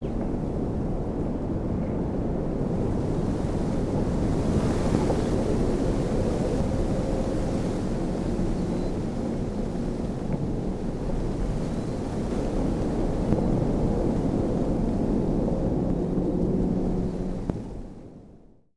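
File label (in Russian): ветреная атмосфера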